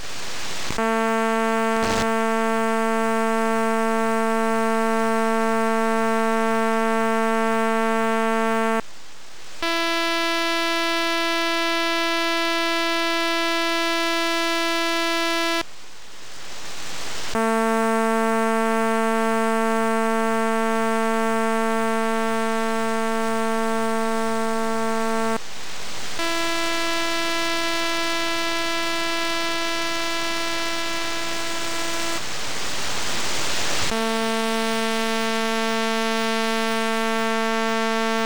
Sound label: othr amop 226 333 sps; othr amop irn 226 333 sps